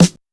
Snare

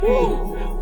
"Woo", vox

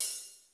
Open Hats

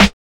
Snares

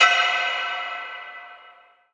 ride cave

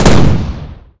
auto fire

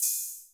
Open Hat

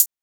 HiHats

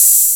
Closed Hats

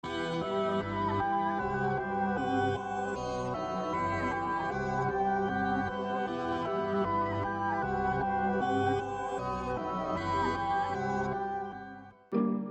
One Shot Kit

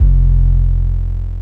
808s

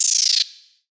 Roll Hi-Hat; Closed Hats

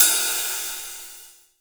Perc; ReddLive

